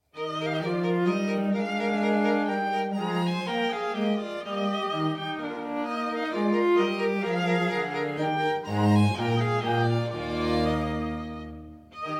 String Quartet in E-Flat Major; Op. 1, No. 2 Rondeau

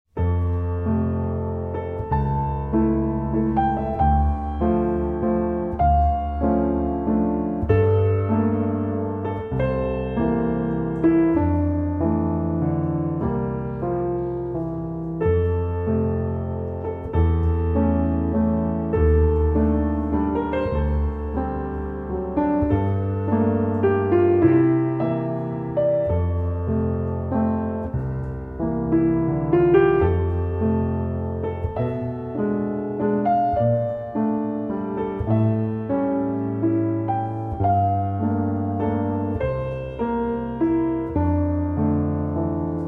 Two Menuets (Piano Version)

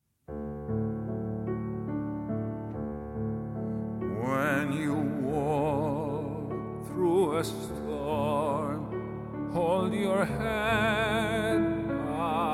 Acoustic

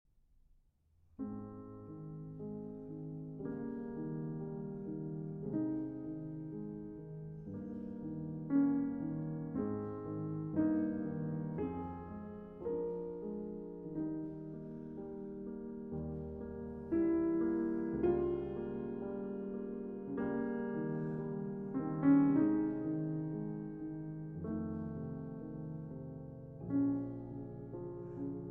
Piano Sonata No. 8 in C Minor, Adagio Cantabile

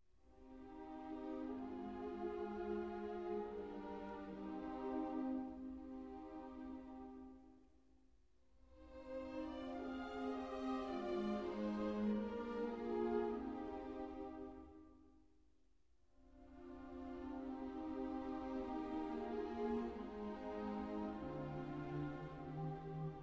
Piano Concerto No. 2 in F Major; II. Andante

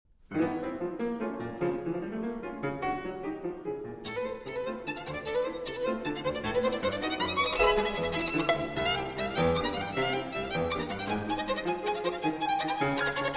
Violin Sonata in D Major, Scherzo. Presto; Prokofiev; Violin Sonata No. 2; Op. 94bis II.; Poco Più Mosso (Live)

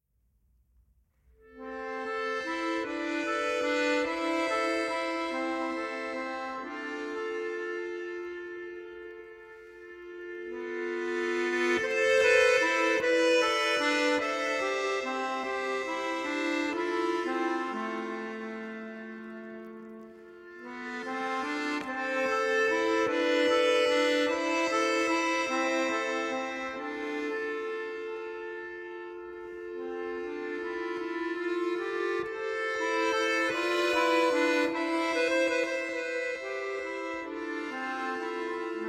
Waltz - For Accordion

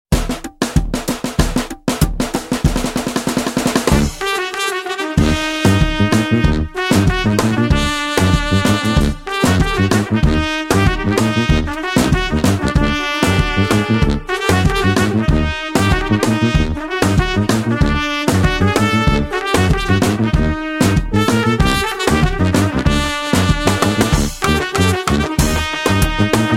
Fanfare

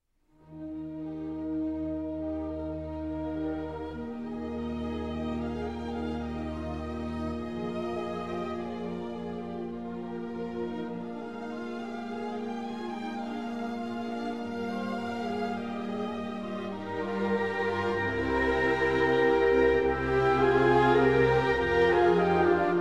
Violin Concerto in B-Flat Major, Adagio; Mozart; Violin Concerto No. 1

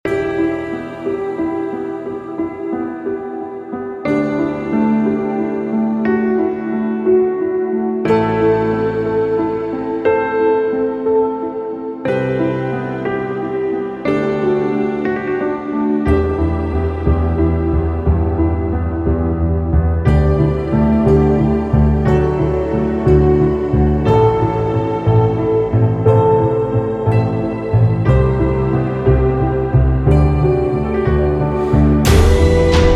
Piano Version

